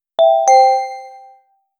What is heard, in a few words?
an electronic device noti; electronics